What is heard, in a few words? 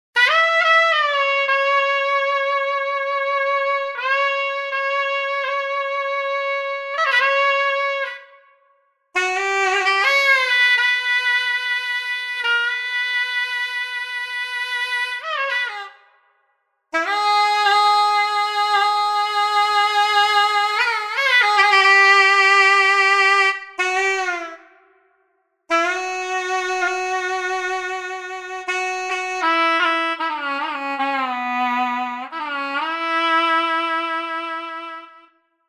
shehnai shivaranjani